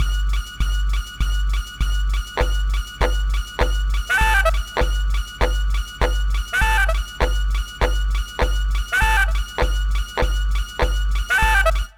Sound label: Weird Cartoon Groove